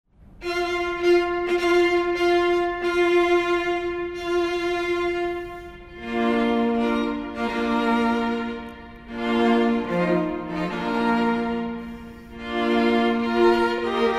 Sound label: bridal chorus from lohengrin